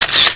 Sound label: camera shutter; Samsung SGH-F300